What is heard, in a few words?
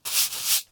Broom Play